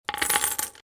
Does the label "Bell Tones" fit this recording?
No